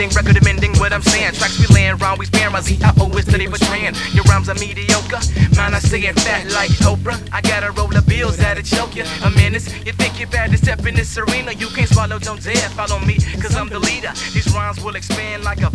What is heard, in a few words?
rap music; conduit